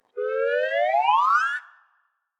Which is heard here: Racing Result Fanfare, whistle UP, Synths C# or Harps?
whistle UP